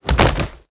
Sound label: SFX Fight Die; Mighty Party; Android